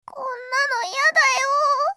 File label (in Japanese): 死亡; ルディン